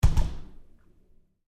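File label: Door Bathroom Stall Close close-up Lite; Bathroom (Sound Effects:Household); Big Room Sound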